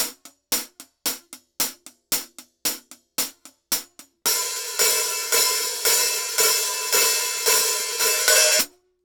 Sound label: Hi-Hats, cymbals